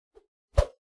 meleeattack swoosh light, weapon swooshes; psfx

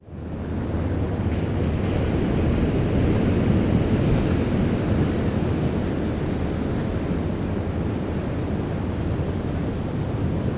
ocean shoreline wide general perspective distant; sonniss gdc

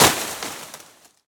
forest tree branch leaf foliage impact short; bluezone; sonniss gdc

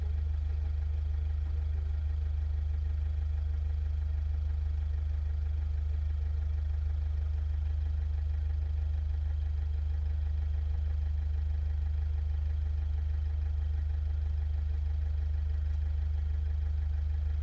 car citroen c2 drive stop exhaust; dpa4062; pmsfx; Sonniss GDC

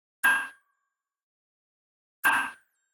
ui error; Sonniss GDC

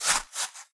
digging

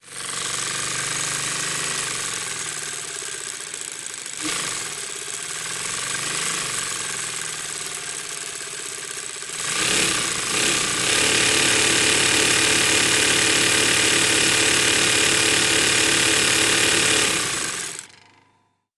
sewing machine; sonniss gdc

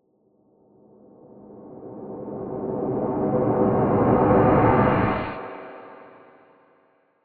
fm swell; sonniss gdc

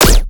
abstract guns; sonniss gdc

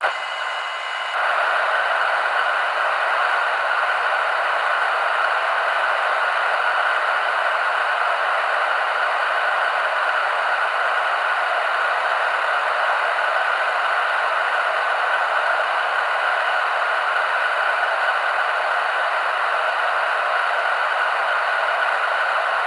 cb radio freq static

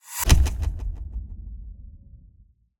ui mechanical confirm; sonniss gdc

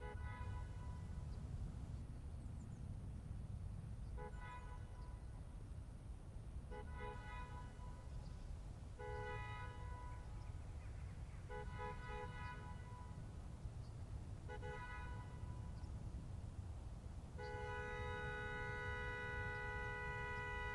volvo 850 ext very distant horn various; fvtt sonniss gdc audio

